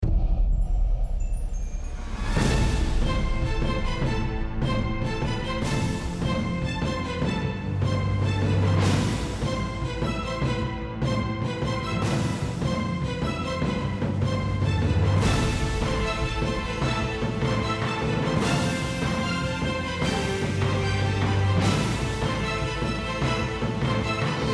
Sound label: Underground score